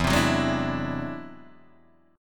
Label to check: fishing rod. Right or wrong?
Wrong